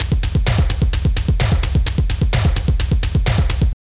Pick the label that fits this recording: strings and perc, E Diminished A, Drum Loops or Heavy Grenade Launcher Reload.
Drum Loops